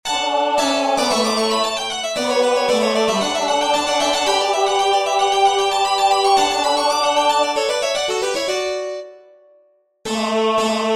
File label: tenor; Waiheke Island Choral Society